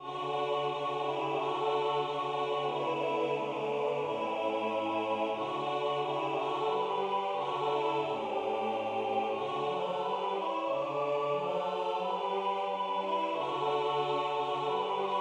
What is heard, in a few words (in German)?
Gfunde Bass